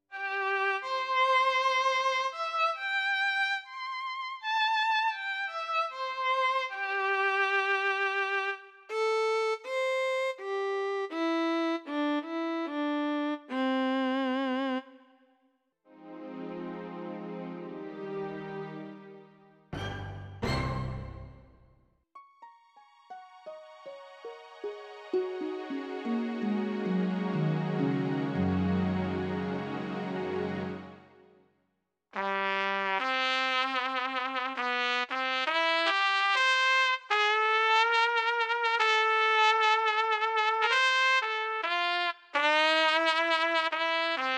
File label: Casio CT-S1000V Strings Brass and Wood